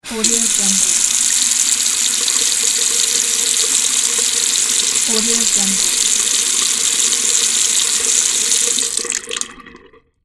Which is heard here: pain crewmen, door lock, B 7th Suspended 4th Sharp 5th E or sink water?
sink water